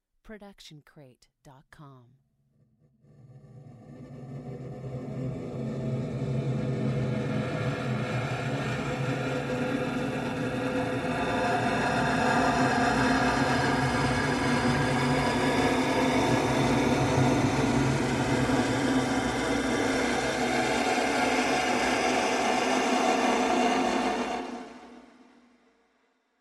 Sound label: horror noise